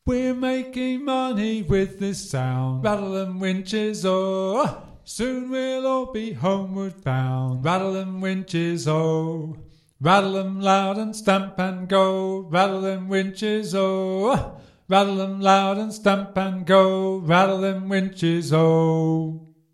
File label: Rattle Them Winches - Tenor; eccleshall singathon